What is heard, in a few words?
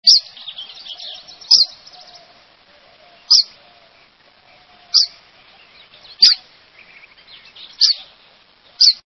Verdin, songs